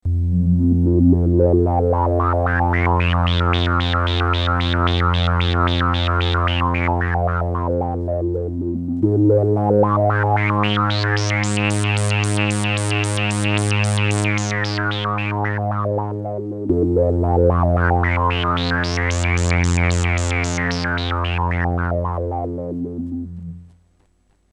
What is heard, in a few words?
square filter mod